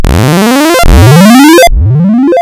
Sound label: Partials Non Sine, wavetables; Architecture 32